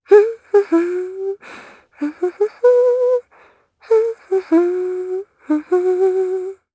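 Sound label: Vox Hum Japanese; Lorraine; kings raid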